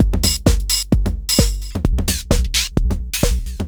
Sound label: Beat (130BPM), techno; techno drum fx